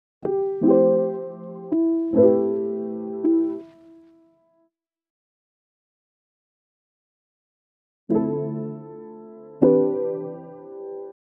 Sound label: 80 BPM C Maj Piano 2; Loop Stems; Cymatics; People Change